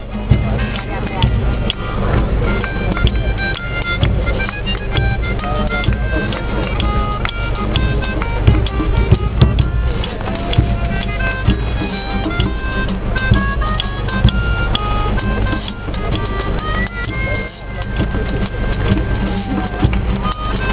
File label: start of mani music organy